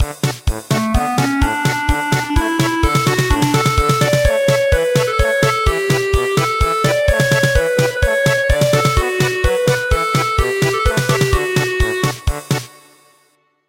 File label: German Polka